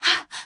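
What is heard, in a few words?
moan, female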